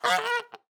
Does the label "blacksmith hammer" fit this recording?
No